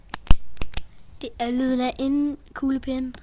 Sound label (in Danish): klik klik